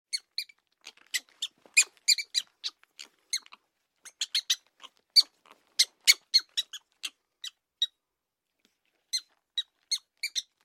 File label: Prairie dog; mouse, rat, or any rodent squeaking